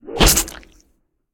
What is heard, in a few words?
deep ooze slam; SyrinScape; East Ward